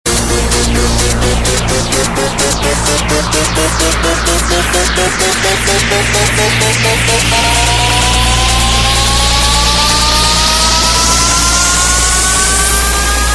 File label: head shot